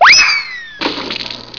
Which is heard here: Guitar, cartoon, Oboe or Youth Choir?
cartoon